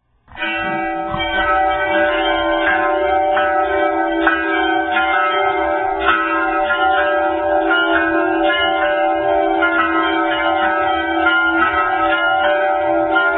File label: campane